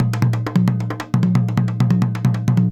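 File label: junjunba groove; Best Service; World Colours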